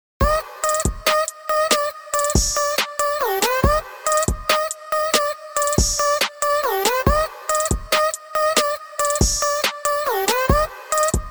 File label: hiphop; GarageBand（ガレージバンド）